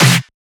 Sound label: SnareClap; FLPRO